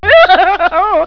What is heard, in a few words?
giggle